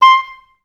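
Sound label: oboes staccato C5, orchestra; Best Service; Peter Siedlaczek's Advanced Orchestra; OBS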